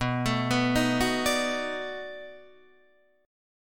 B 7th Sharp 9th, down, Guitar, Open D

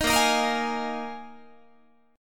A sharp 7th Suspended 4th, Guitar

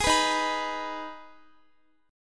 D sharp Suspended 4th Sharp 5th, Guitar; x,x,18,16,15,18; strummed

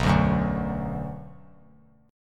B-Minor; strummed; JGuitar

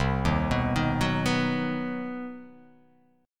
C Minor Major 7th Flat 5th, CmM7b5 chord; arpeggiated; JGuitar

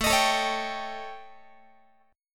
A 7th Flat 5th Guitar; A7b5; strummed

